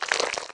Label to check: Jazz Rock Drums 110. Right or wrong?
Wrong